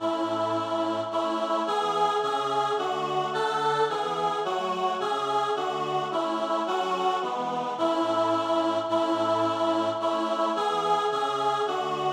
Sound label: Alto; Omnis mundus jocundetu